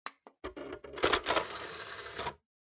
Optical Disk Drive Open

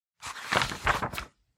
Magazine Flip

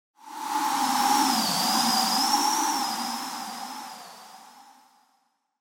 Wind Synthetic

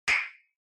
Castanets

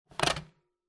Telephone Hardware Receiver Pick Up

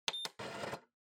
Time Recorder, Button

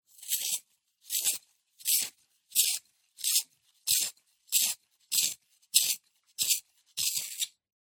Pencil Sharpener Sharpen

